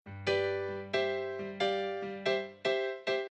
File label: loop rock